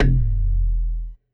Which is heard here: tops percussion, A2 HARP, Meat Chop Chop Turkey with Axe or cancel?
cancel